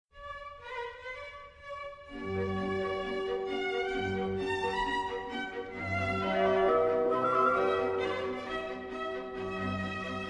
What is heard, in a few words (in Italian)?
Concerto Per Pianoforte G-Dur